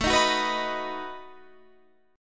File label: B-Major A